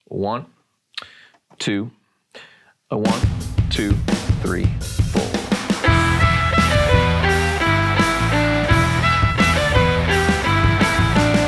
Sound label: Violin